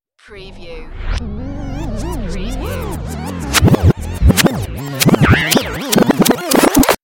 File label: Turntable Scratching Reverse